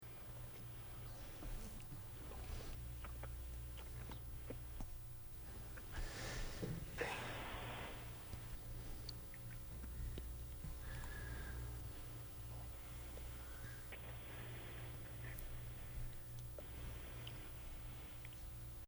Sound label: Room Tone; isolation